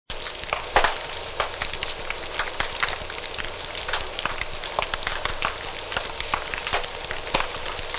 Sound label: snap